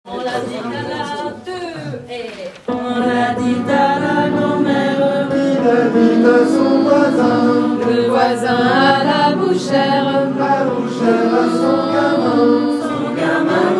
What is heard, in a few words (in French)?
Voix Soprano